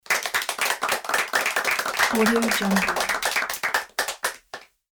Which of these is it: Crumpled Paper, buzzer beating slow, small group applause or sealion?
small group applause